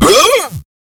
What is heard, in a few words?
pain; awesomenauts